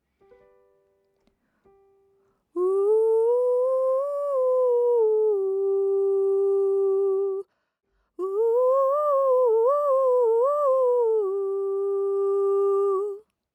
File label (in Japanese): uragoe